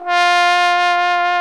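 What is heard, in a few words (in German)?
POSAUNE VIBRATO; Best Service; Alpine Volksmusik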